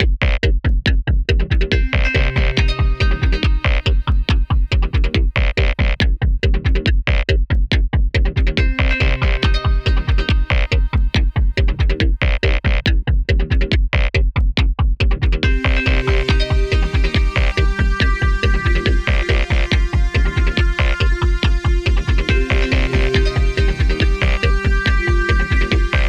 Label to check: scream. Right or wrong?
Wrong